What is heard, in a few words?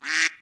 quack; Super Trench Attack 2